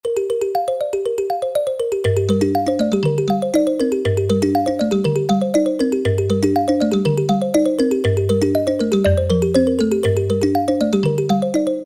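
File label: percussion Kalimba, E-mu PK-6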